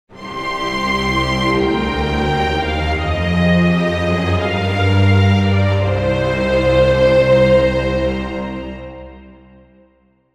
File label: game over